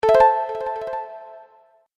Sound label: Games Win Sound, video game